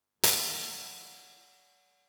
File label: Hats, One Shots; Touch Loops; CosmicFunk